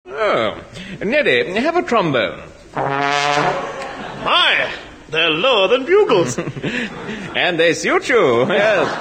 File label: trombone